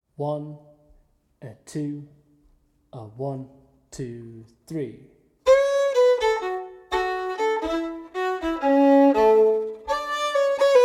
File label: Fiddle Steady; The Miller of Drone